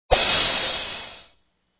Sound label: Cymbal